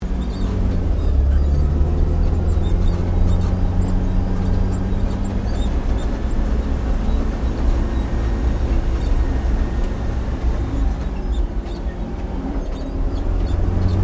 APC FV603 Saracen Manouver Slow Interior, British Saracen Light armoured vehicle; Trucks; Vehicles; SoundEffects-Foundation1